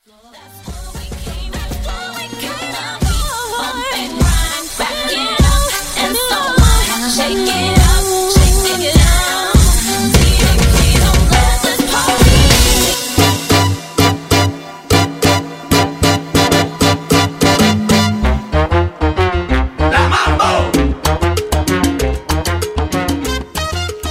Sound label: Samba Mambo